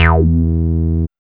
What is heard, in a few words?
BASS